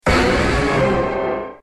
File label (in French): Cri Méga; Y; XY; Cri de Méga-Dracaufeu Y dans {{Jeu XY}}; Catégorie:Cri de Dracaufeu